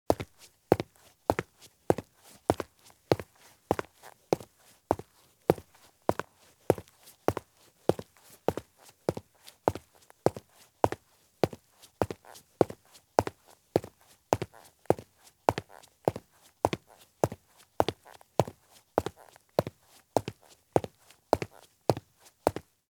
Men's Soft Business Shoes Walk at Fast Pace on Concrete; Sound Ideas; Footstep Sound Effects